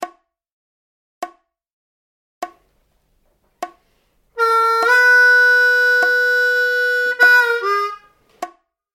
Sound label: Lick, harmonica